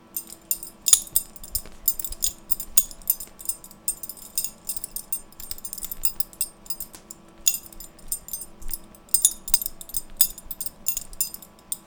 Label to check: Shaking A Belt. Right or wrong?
Right